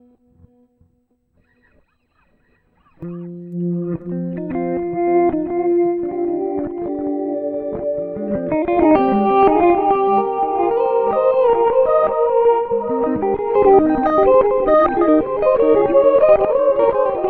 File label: Reverse